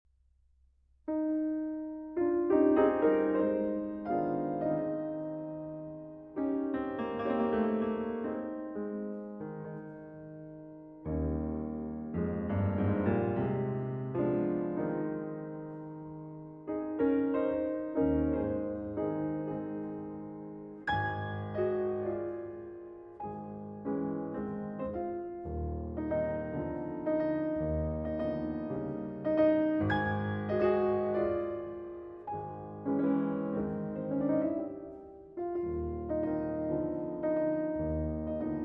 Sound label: Ballade No. 3 in A-Flat Major